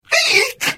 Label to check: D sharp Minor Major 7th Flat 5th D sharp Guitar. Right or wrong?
Wrong